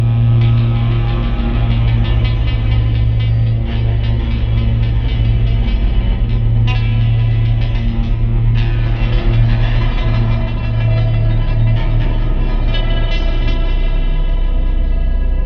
Ambiant; edge of eternity; Ambiant Phase2

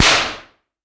slap

